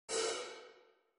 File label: Hihat